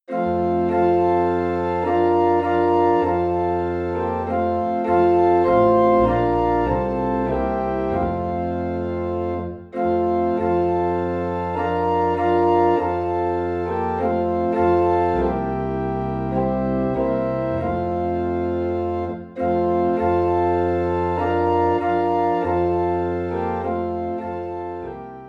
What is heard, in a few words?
Organ in F; My Jesus I Love Thee